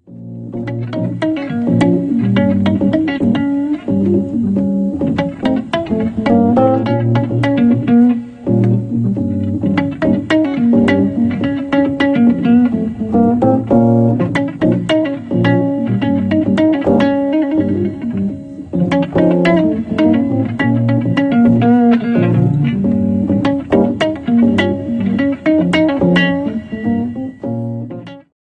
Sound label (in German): Klingelton